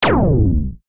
Futuristic Gun Sound; FXHome; Bullet and Gun

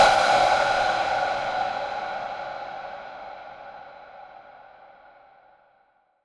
Breath Attack; Sci-Fi; Chris Logsdon; Sci-Fi SFX Pack

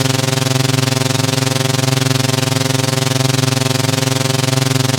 engine Circular; Kenney's Sound Pack; Sci-Fi Sounds

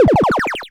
upgrade; Kenney's Sound Pack; Retro Sounds 2

